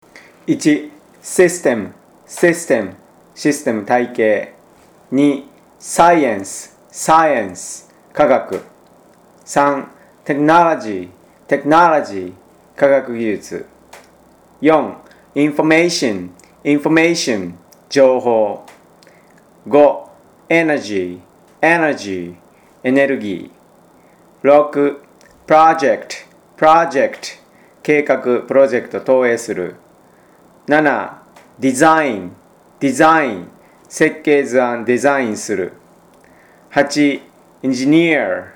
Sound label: tango